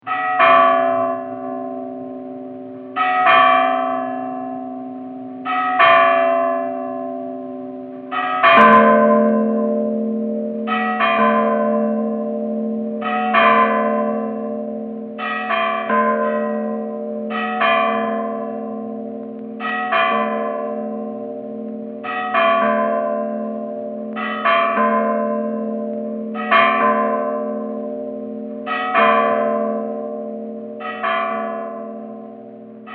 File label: Mission Church Bells; Bell Sound Effects; Musical